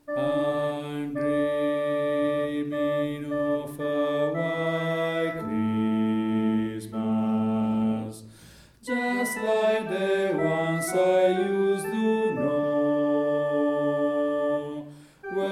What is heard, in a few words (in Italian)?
bassi